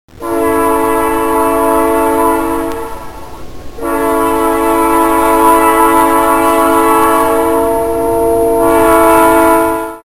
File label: diesel